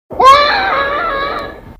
scream; Minions

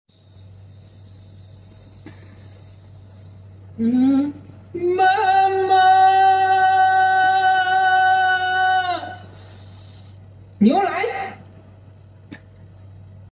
screaming mom

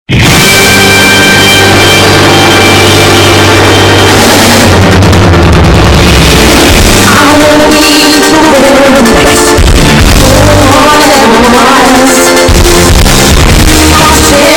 earrape; pokemon theme; meme

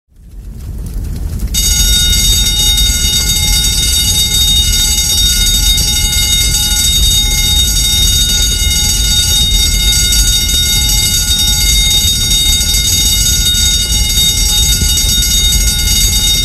Fire Drill; school fire drill

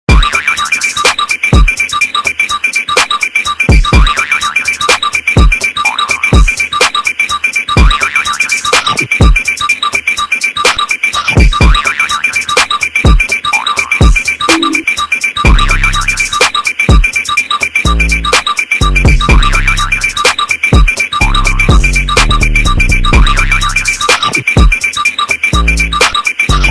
Goofy ahh beat

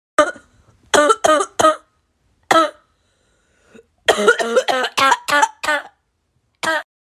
Bad Cough; nasty cough; Tik Tok

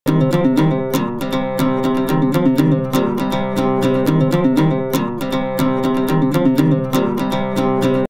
Blue grass

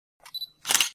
Modern Camera Shutter

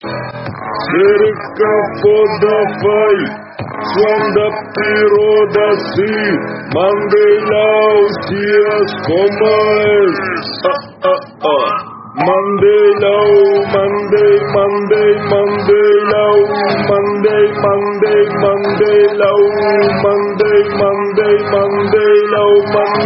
Ultra Slowed meme sound effect

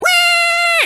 charging; pikmin

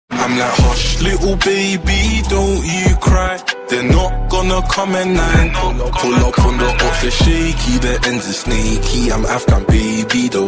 hush little baby british

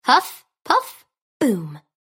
Huff puff boom

